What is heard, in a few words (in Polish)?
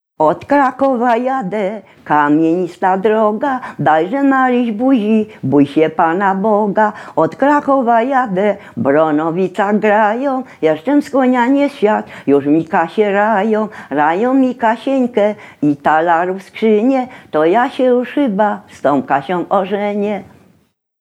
przyspiewki; Od Krakowa jade kamienista droga